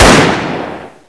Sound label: ak74 fire; paranoia